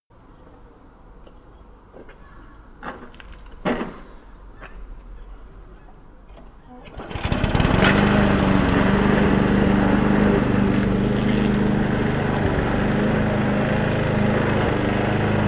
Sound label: mowing lawn